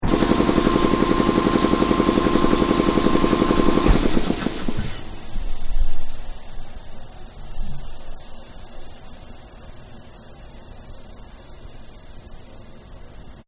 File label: Compressor, Compressor medium distance, run, off; Air Compressor; Air Pumps; Machines